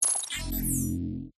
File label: Interface Beeps Power On; lesser vibes